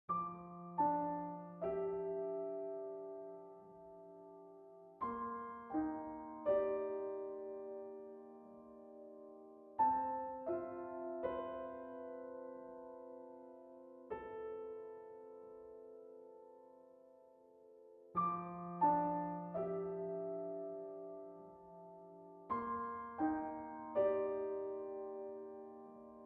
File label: piano piece, contemporary piano repertoire; humberstone; organist online recordings